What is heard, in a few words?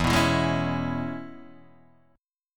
E 6th, down, Guitar, Open D